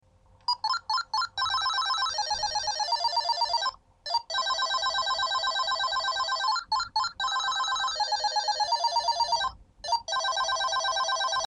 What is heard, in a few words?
fanfare